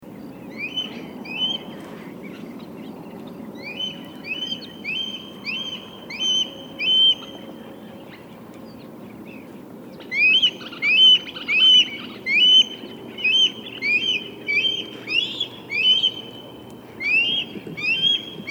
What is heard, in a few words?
Red tailed Hawk Buteo jamaicensis